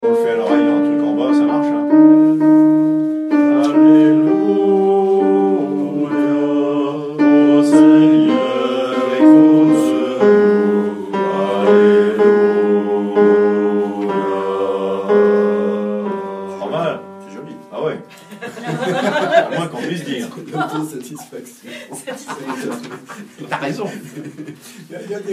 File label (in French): Oh Seigneur écoute nous Basse